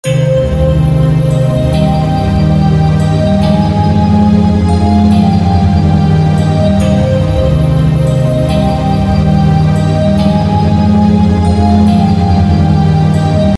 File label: hard import pad